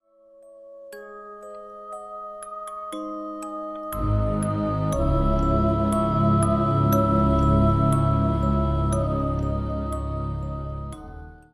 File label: melancholia music